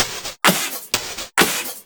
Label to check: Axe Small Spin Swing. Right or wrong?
Wrong